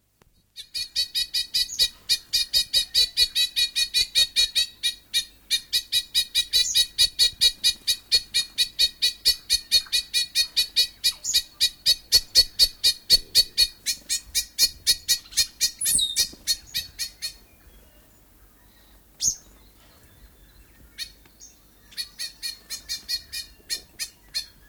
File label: bellbird, bird song; conservation, native animals, birds